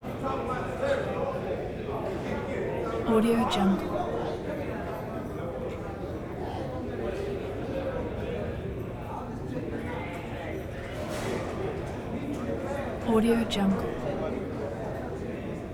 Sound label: prison mess hall male crowd murmur walla